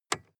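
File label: sports car interior lightswitch; verkehr transport; autos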